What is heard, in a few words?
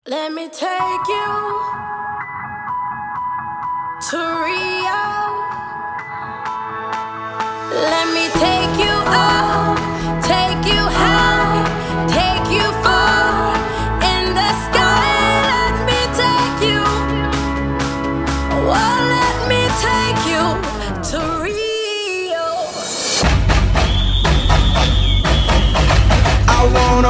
LATIN MEDLEY; RIO; PRE-TEEN B